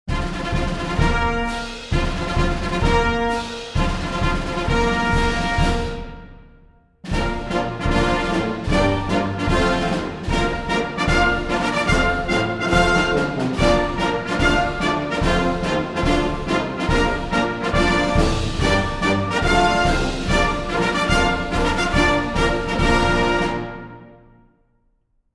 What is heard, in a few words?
THREE RUFFLES AND FLOURISHES AND GENERAL'S MARCH